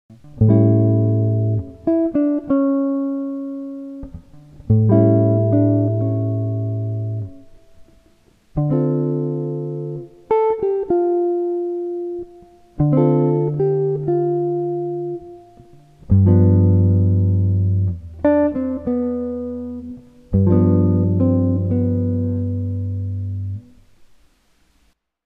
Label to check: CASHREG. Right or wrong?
Wrong